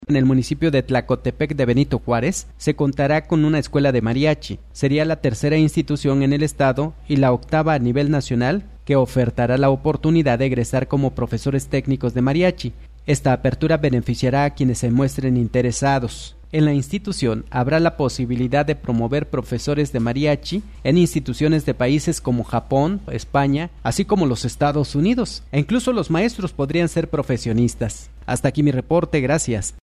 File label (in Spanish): mariachi